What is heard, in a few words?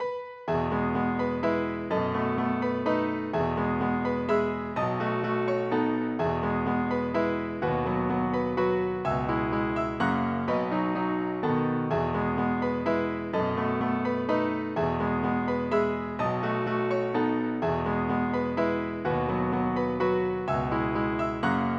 waltz; Total Web Page 99